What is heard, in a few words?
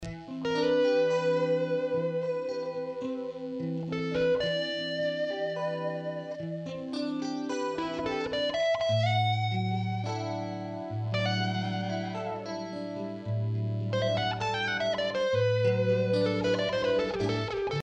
Improv Test